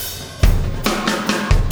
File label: Impact Crater Beat, Processed Drumkits